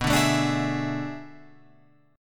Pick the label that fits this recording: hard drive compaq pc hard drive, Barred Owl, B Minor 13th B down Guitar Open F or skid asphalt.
B Minor 13th B down Guitar Open F